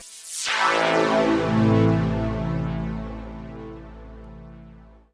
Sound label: SYNTH PAD INDST 140 A, Industrial; Sphere